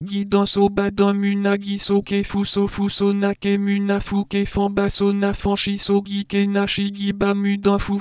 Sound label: volume 43Hz